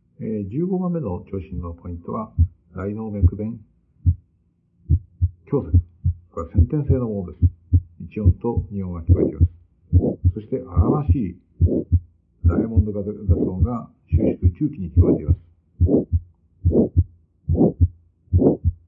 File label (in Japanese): AR先天性